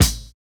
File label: Kick, jungle breaks drum sounds